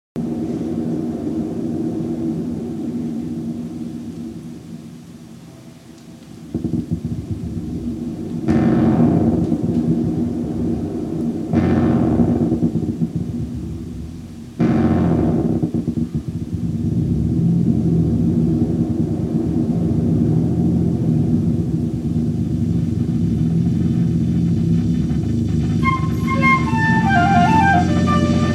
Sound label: soundscape